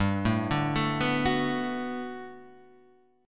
B Minor 7th G, chord; Bm7/G; arpeggiated; JGuitar

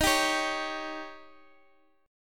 D sharp 7th D sharp, Guitar, Open F; D#7; strummed; chord shape; x,x,x,10,10,8

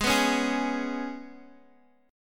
Ab Minor Double Flat 5th Ab, Guitar Open D

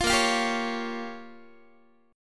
A sharp Minor 6th A sharp down Guitar, chord; A#m6; strummed; 20,18,20,17,17,x

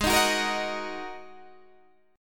G sharp 7th Suspended 2nd G sharp Guitar Open D

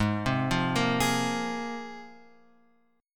G sharp 6th Add 9th, Guitar, Open F; arpeggiated; x,3,0,0,1,3; JGuitar

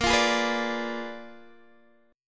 A sharp 7th Flat 9th A sharp down Guitar Open D

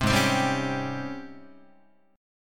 G sharp Major 11th G sharp, Guitar; 3,4,3,5,5,6; JGuitar

